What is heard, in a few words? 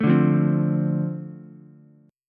D-Minor 7th, D, Ukulele; Dm7; strummed